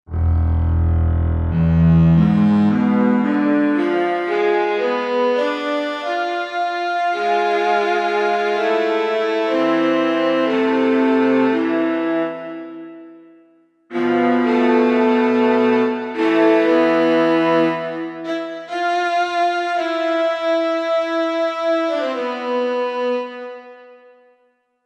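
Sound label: Cello Strings, Korg MSC-1S; Korg MSC-1S Variety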